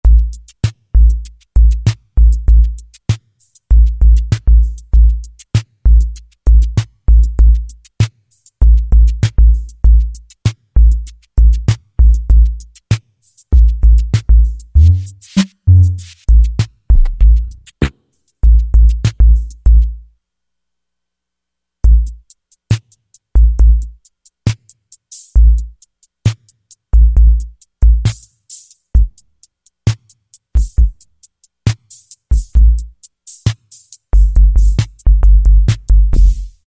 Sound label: V-808 KIT, Roland V-Synth; Factory Patches